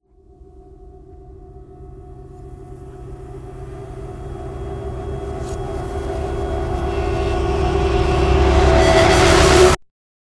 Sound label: Production Music Trailer Tools Suckback; CATastrophic